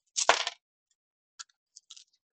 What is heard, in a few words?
sound of coins dropping; winchester; century ghost